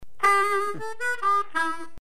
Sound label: Tone Bad, harmonica